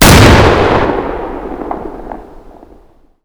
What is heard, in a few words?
Projectile Sounds; Red Orchestra Ostfront 41-45